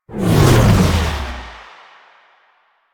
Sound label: Motorcycle Tunnel Exit; Warframe; Quests; Nineteen Ninety Nine